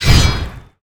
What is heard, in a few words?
Slash; Final Dawn